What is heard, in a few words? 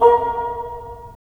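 wind bassoon; LA Composer vol. 3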